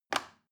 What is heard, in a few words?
plastic button little release heavy